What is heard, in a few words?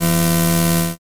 weld